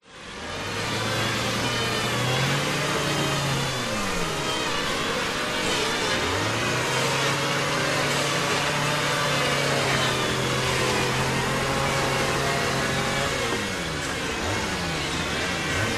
industrial wood carving electric sanders street traffic distance many indonesia